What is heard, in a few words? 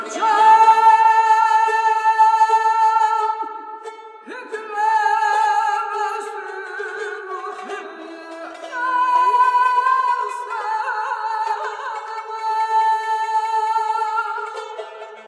tenor